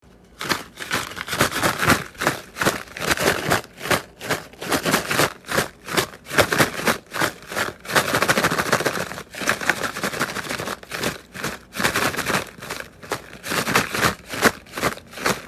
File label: Paper Instrument